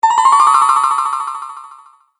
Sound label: Magical Movement; Magic Sound Effects